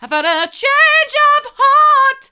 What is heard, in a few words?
i've had a change of heart hard, vocal